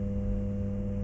bzz; Mafie 1

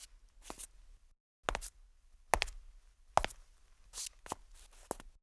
kroky; Mafie 1

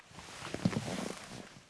poposednuti; Mafie 1

